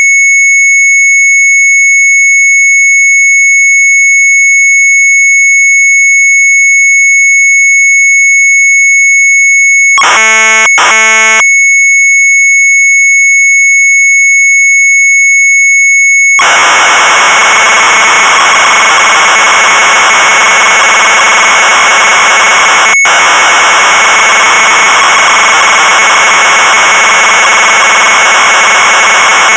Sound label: MZ800 Tape, sharp; Plotter vol. 4; retromelsarchive